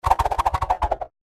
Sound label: Solo Spin; Sheetz; SheetzTour